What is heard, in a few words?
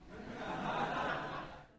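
Crowd 100 Short Light Burst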